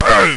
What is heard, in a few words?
block